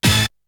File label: Hit; ZERO G - Datafile DISC 3